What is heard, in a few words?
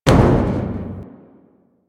Metal Barrel Impact